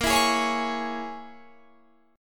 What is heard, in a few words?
A sharp Minor 7th Flat 5th A sharp, Guitar Drop D